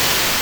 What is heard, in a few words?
static; mother2